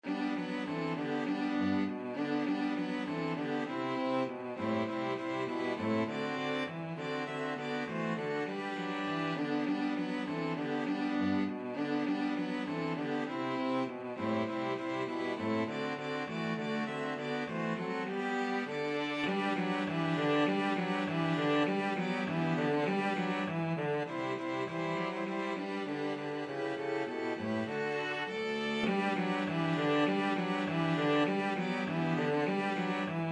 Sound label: jingle bells, string quartet